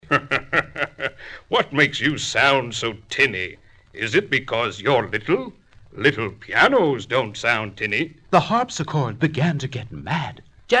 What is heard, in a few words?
Piano to Harpsichord; Said Piano to Harpsichord